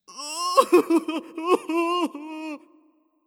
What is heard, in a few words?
Hero Escape